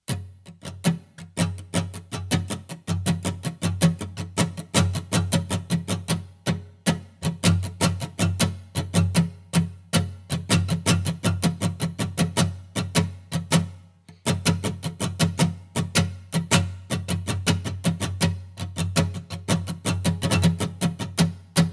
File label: Muted Strumming Drill